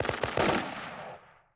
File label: Machine Gun Multiple Short